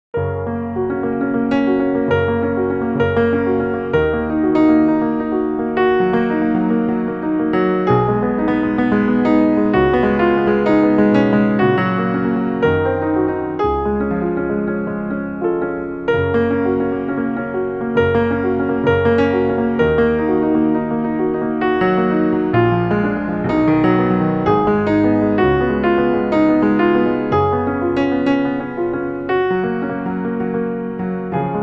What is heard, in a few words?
impromptu, piano